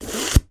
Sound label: unzip